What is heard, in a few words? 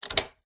household front door heavy wood metal latch turn